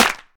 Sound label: smooth trap hand clap a sharp key